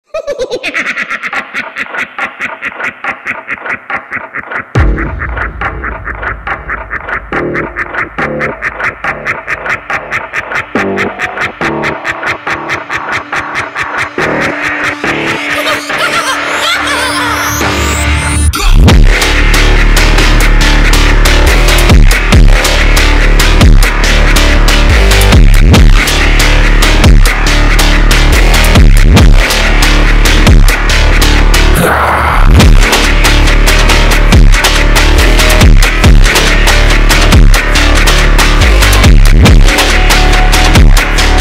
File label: brazilian phonk